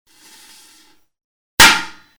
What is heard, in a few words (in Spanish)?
Explosión; Banco de Sonidos - Facultad de Art; Lazzeri - Gallichio - Alperte - Calvo